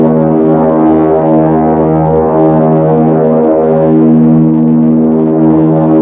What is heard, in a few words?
bomber propeller strain; planes; gmod; lvs planes